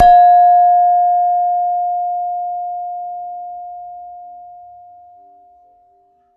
Gender, Gamelan

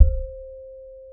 Saron D knock, Gamelan

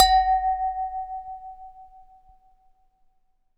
Glass Clink, Beer Glasses; Miscellaneous Beer Glasses

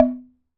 Wooden Bar Hard Strike

